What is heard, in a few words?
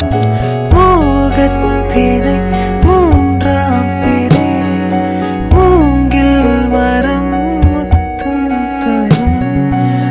Tamil; Pizza New